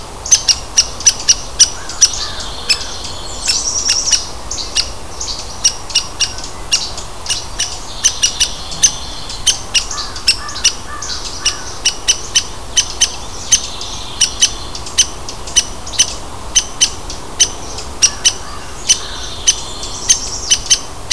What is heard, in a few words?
downy Woodpecker; Downy Woodpecker (adult); zoology